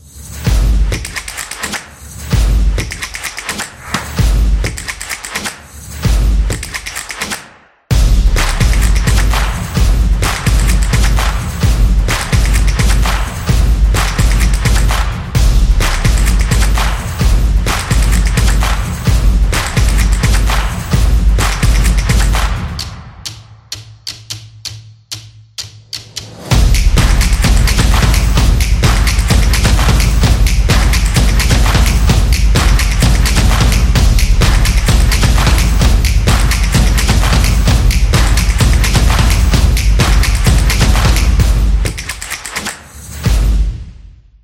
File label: This Is Drums Opener; samba